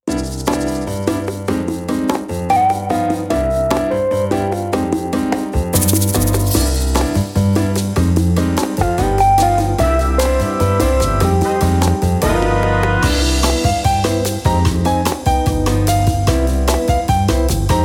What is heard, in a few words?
bossa